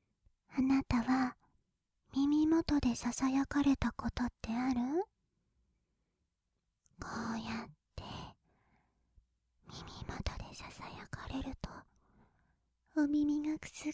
事後のWサキュバスのささやき＆吐息; サキュバス姉妹があなたのところにあらわれた!

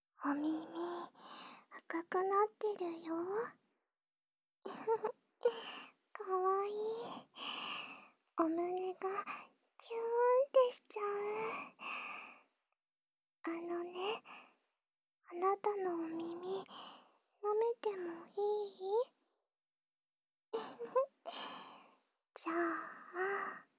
蕩ける耳舐め; バブみ中毒【ロリ巨乳にメロメロ】; 常世常闇所々

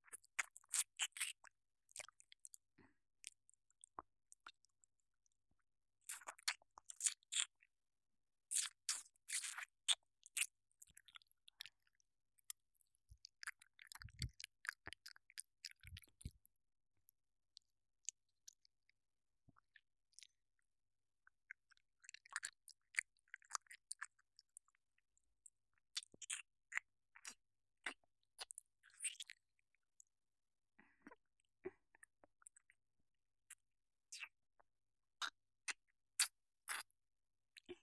好き 右耳耳舐めのみ

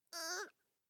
首折り; 効果音なし

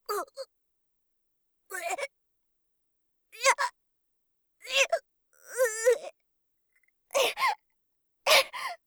腹パン; 効果音なし

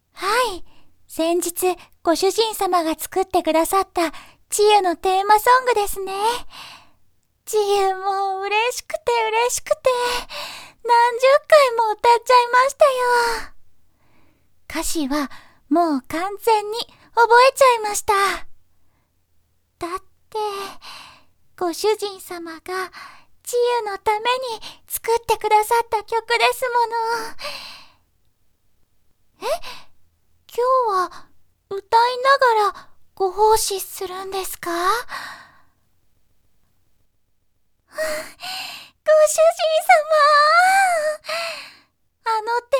歌いながらエッチ

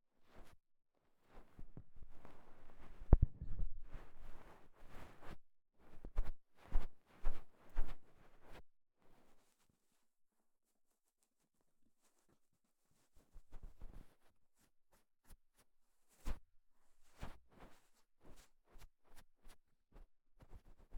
梵天; KU100

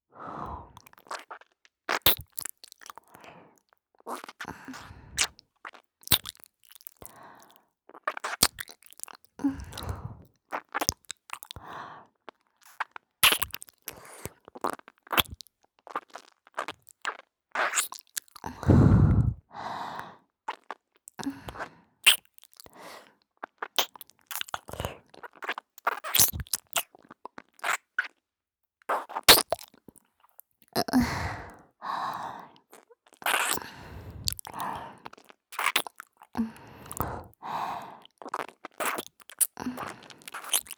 ちゅっ…ちゅっ…, ASMR

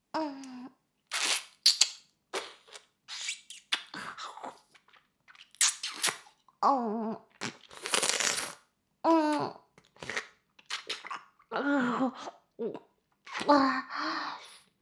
フェラ音声; 少尉; 牧場戦腺

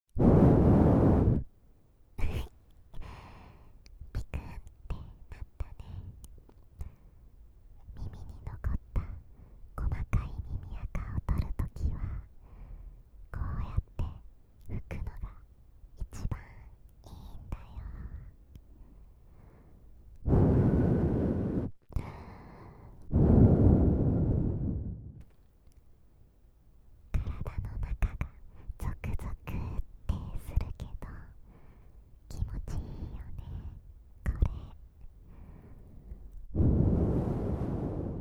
お姉ちゃんのお耳ふーふー（右耳）; 心拍音off

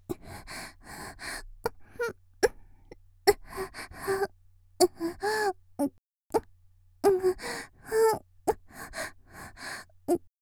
「喘ぎ」声を潜める10秒; 綾波系少女

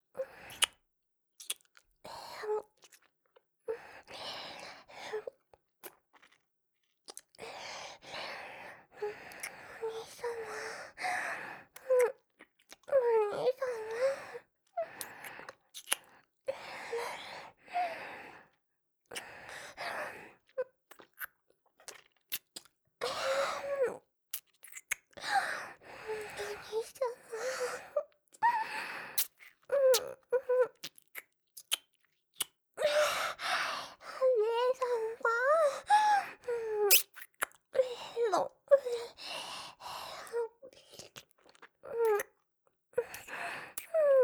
キス; リリア

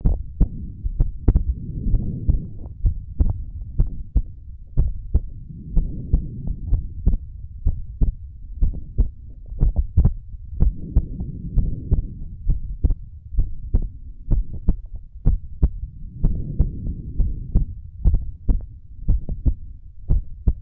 心音

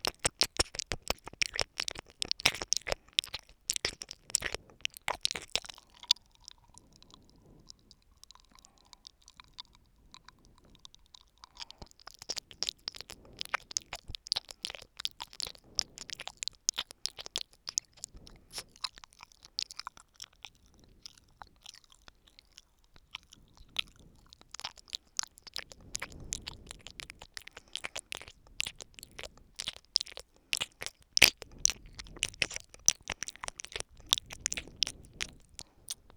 左耳深め

